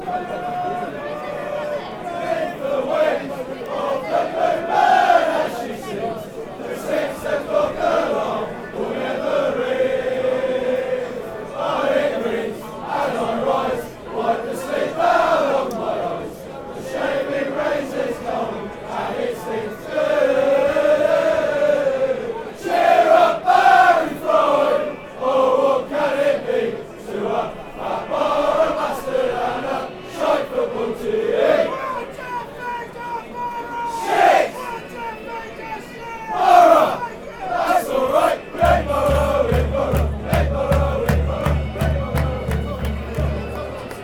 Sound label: cheer, fanchants; barry fry; cufc